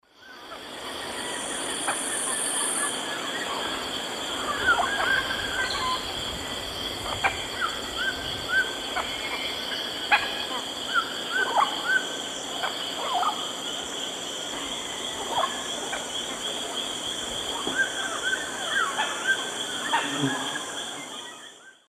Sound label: Amazona farinosa, feeding calls; S. America; Eastern Lowlands